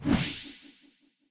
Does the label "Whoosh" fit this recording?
Yes